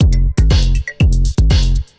Tech Beat 120, techno 120bpm; musicradar; Beats

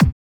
Kick, techno drum

